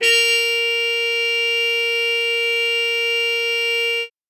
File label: trumpet a#4, Trumpet harmon mute